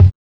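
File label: BD, bass drum; X-Static Goldmine 1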